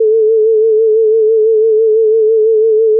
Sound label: fm vibrato